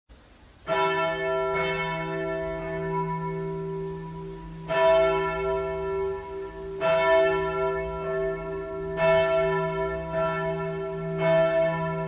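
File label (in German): Tonaufnahme d1 e1, mehrstimmige Geläute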